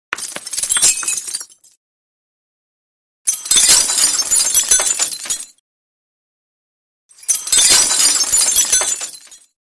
Glass Falls Light Very Light Tinkling Rain Of Fallin; Hollywood Edge Foley Sound Library